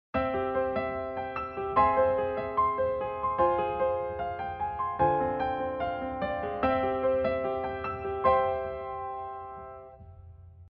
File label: modal interchange